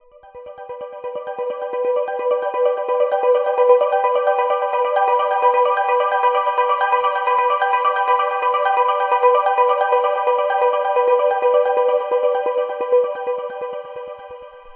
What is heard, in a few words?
ambient